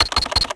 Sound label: Security Code; Max Payne